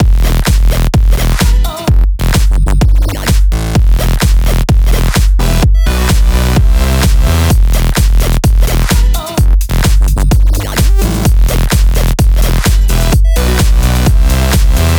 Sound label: 128BPM Mix; Vengeance Samples; Knight Kit